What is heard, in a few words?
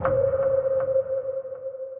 Grand Piano; Grand Piano Lost Ship; Ableton; Factory Packs; Grand Piano Hybrid